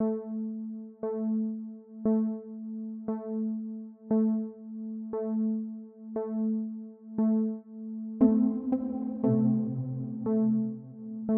System Arp

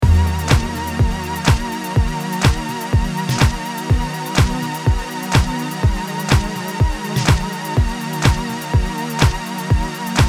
ending chord sample; melancholic tronic

